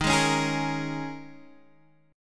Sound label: Bb Minor 7th, Eb; strummed; chord shape